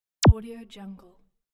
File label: synthesized zap fx